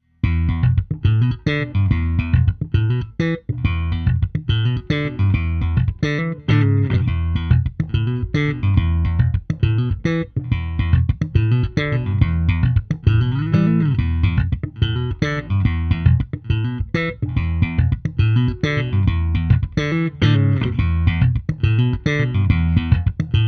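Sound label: Jazz Active Slap, bass; NXT210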